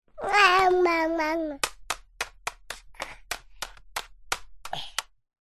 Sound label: human child boy 15 months play with toy talk jibberish bang toy